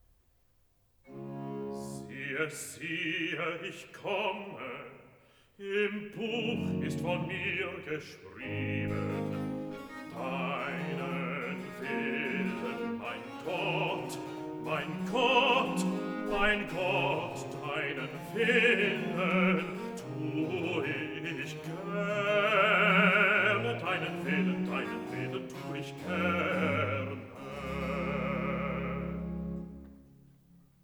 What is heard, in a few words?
Cantata, Recitativo (Bass); BWV 182 'Himmelskönig, sei willkommen'; 'Siehe, ich komme'; Bach; Walpole St Peter